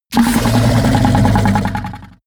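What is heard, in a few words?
fishman snort gargle aggressive loud, creatures; kreaturen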